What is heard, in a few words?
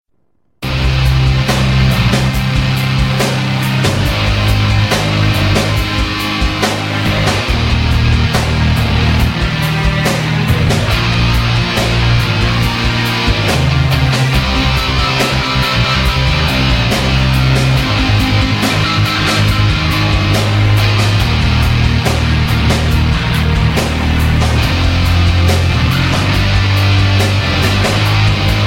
guitar, sms; ringtones